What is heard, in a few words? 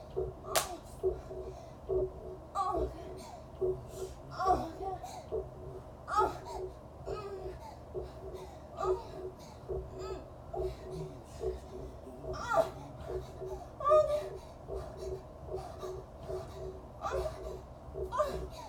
Moans & spanks